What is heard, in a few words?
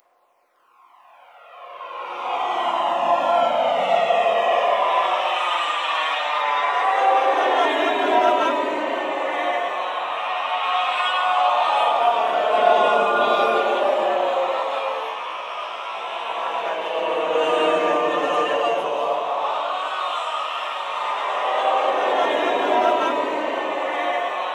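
warm up flange, voices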